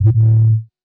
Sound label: BASS SHOT HOUSE 125 A; eJay; Singleshots